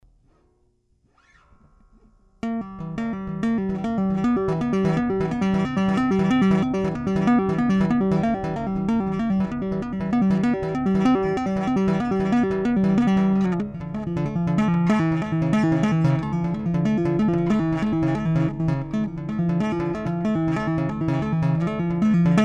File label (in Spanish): Dedos tapping slap armonicos - low hi mid, bajo